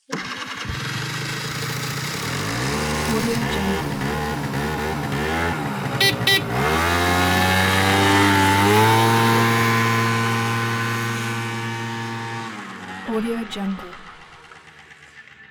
scooter start idle pullaway; soundbits-soundfx

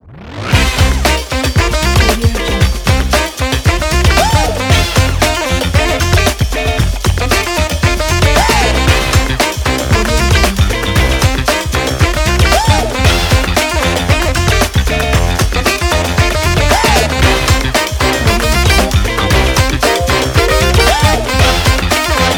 energetic funk sax logo

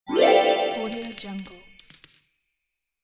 magic coin

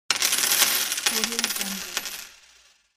coins

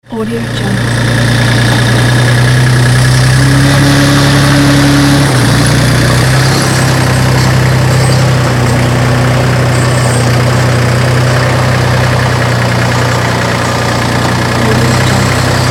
a tractor

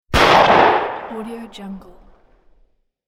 antique shotgun shot

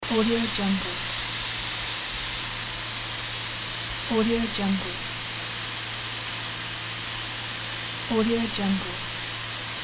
space simulator door slam and seal hiss; hollywoodedge

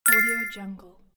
item collect

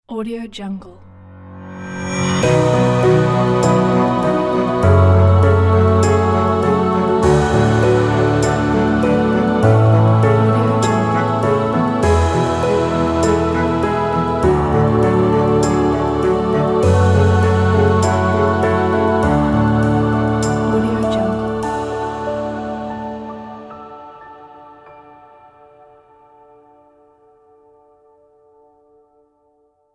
epic cinematic intro

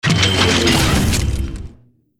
upgrade icon

